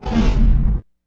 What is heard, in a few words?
IMP FLAME, Cinematic Impact